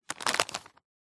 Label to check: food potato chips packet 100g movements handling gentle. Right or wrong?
Right